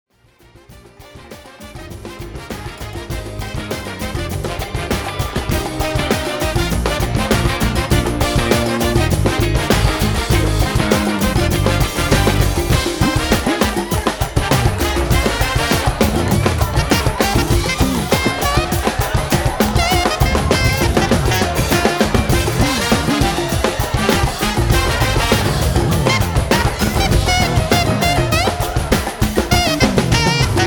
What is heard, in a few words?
Instrumental